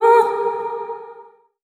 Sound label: oomp; Notes